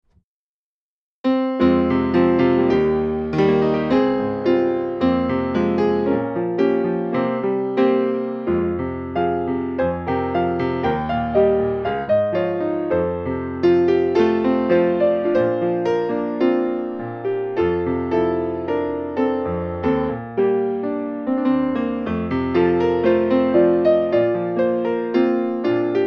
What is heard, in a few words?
shrine piano